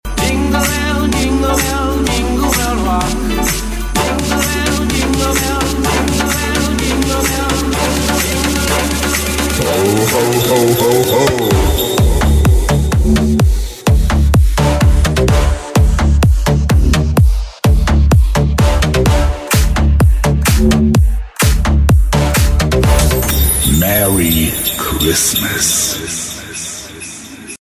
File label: jingle